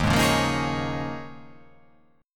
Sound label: D sharp 7th Suspended 2nd Suspended 4th